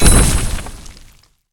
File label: feature Octo Slam; rage of the seas